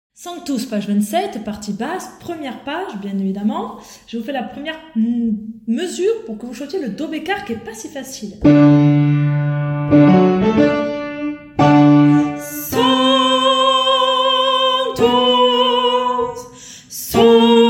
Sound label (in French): partie basse; Sanctus p27